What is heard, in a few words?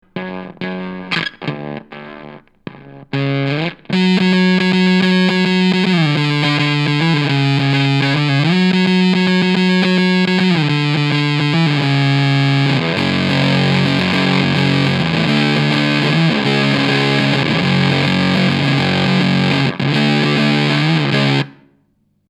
Black Magic Fuzz neck